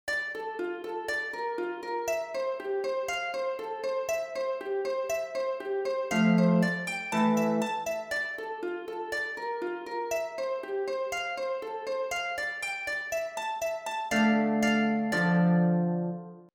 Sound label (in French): HARPE